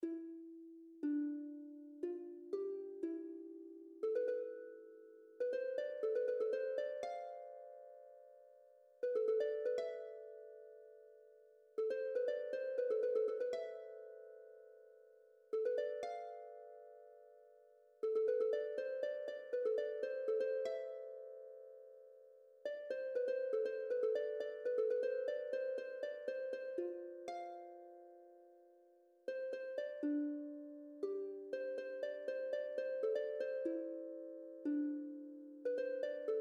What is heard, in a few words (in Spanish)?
Arpa